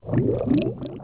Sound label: Underwater; Link; OOT